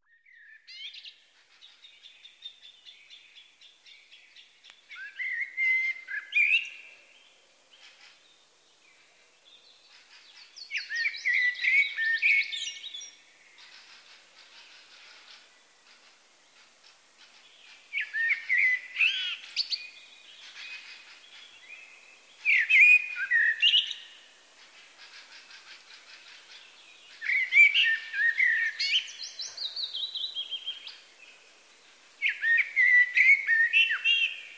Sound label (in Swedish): Koltrast Turdus merula varnande koltrast björktrast lövsångare